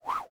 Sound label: Fight Move; mighty party 2017 gamerip pc ios android